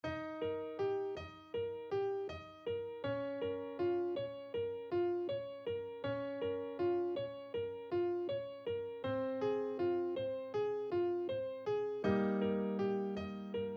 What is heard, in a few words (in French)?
Piano Débutant 80bpm